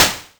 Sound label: Noise